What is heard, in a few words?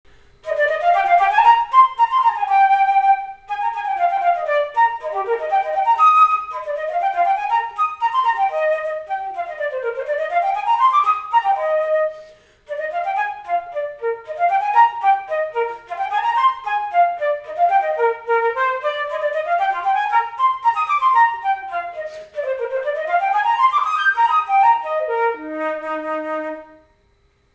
Flute Moderato; 8th grade all state